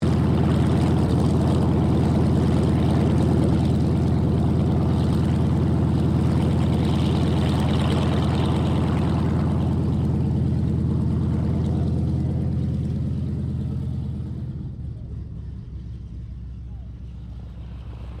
AIRCRAFT AT-6D HARVARD MK IIB TAXI, Harvard Training aircraft; Airplanes; Vehicles; 1942